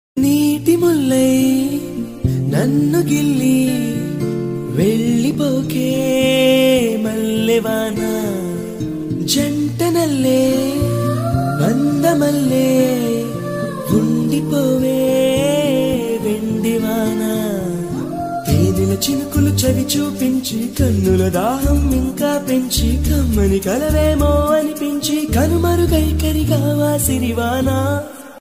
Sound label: telugu love songs; varsham movie; prabhaas; trisha